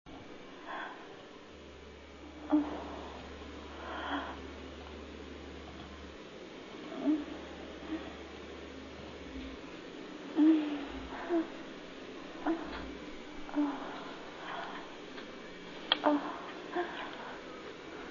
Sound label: masturbation